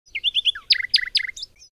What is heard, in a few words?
Canary bird singing